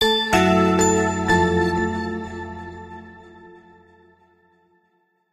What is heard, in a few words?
Power Off; 2011 SHW-M380S; SK Telecom